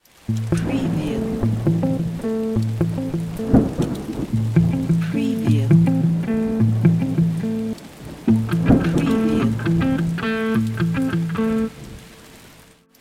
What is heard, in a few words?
ARP Bastaki Am 105BPM, آرپژ پالم میوت بستکی گیتار الکتریک; Guitar, electric